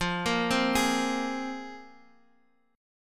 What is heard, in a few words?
C 7th Suspended 4th Sharp 5th, guitar